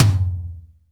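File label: tom, drums; Best Service; Real Mega Drums VOL-1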